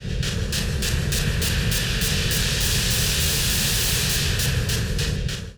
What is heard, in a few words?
steam Engine, trains